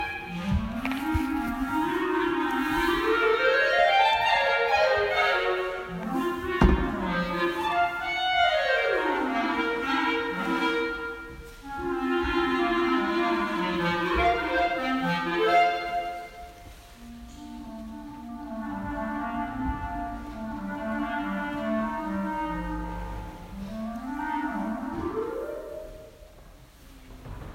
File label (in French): Clarinette